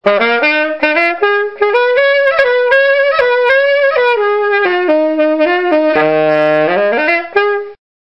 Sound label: bamboo alto; SAXOFÓN DE BAMBÚ